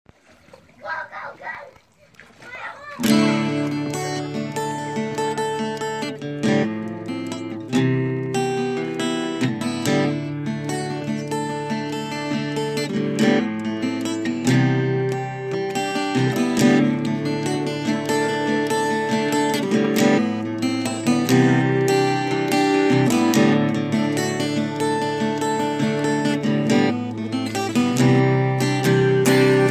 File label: ACOUSTIC